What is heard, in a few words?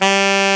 sax alto ff G, Short